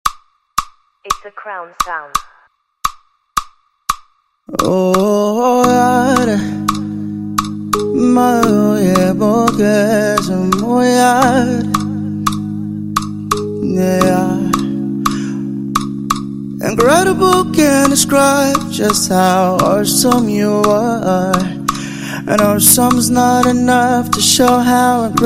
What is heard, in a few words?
Ahh Medley